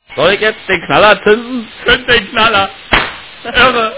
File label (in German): knaller; Source Engine